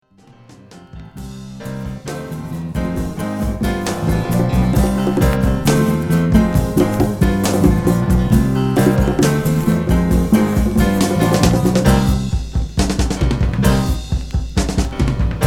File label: ragtime, RAG TIME ORCHESTRA